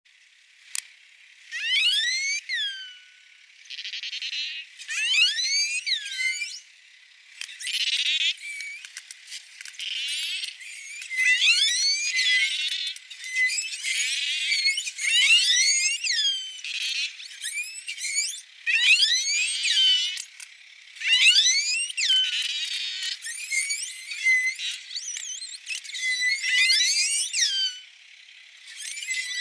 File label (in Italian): Vocalizzazione Globicefali